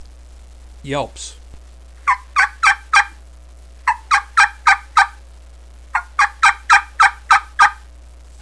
yelp, turkey

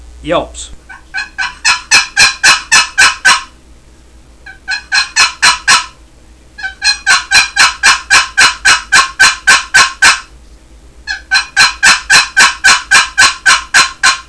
dixie walnut yelps, turkey

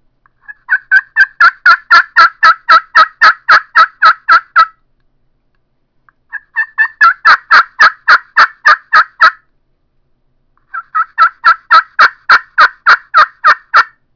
pro glass yelps, turkey; Southland Sounds

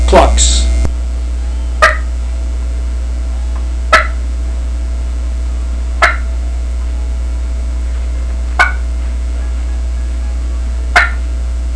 penn clucks, turkey; Southland

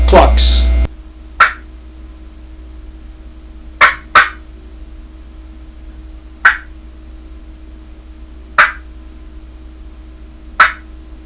clucks; dixie

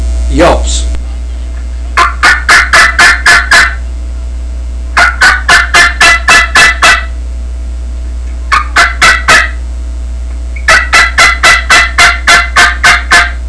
april special yelps, turkey; Southland Sounds

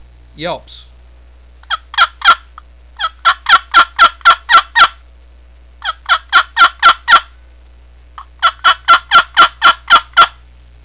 mahogany yelps, turkey

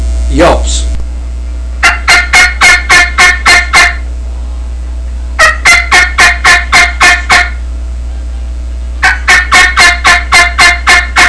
penn yelps, turkey; Southland

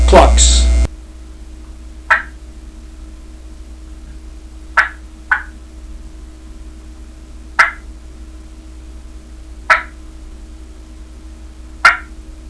dixie dia 5 clucks, turkey

